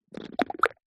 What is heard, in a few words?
human lip flicking fingers